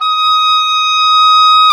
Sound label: oboe, mf f; Roland L-CDX-03 Disk 1; Oboe 2&3